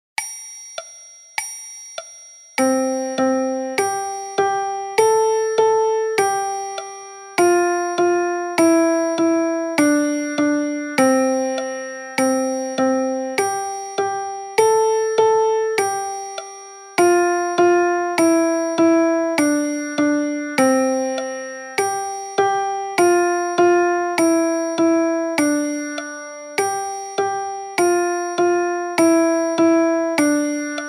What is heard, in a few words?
Twinkle Twinkle Little Star in C Piano Version